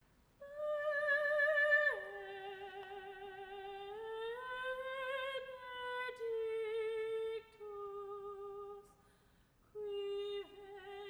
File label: Mass in G Minor, Benedictus